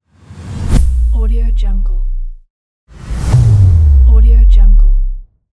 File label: swoosh boom